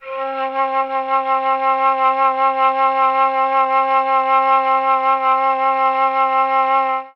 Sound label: flute; Winds